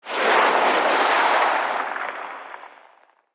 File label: Siege Proj Whoosh; Level Events; Midrath; Warsong Prologue